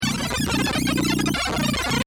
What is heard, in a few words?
SciFi Spaceship Radio Bad Connection; Spaceship Radio - Bad Connection, Weird Alien Noises; Communication Sound Effects